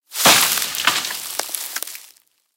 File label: tank collision bush